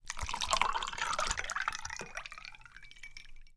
glass water

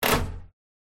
lever

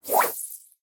fishing rod

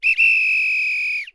police whistle

